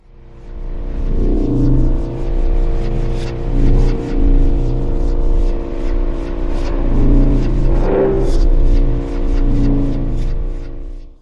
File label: Space Ship Door Opening, Sound of a spaceship or space craft door openi